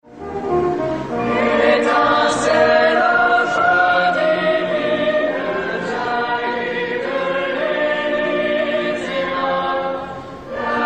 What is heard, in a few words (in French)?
hymne europeen chorale; 14 juillet 2007 paris